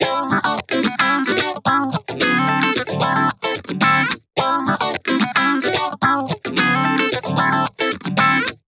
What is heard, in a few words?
Funk guitar original lo color